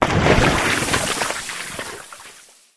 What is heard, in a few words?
lava out